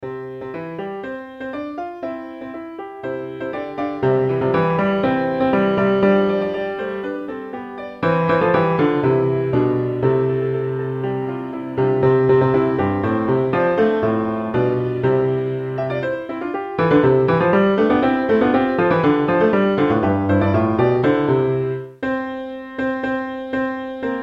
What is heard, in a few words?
Bass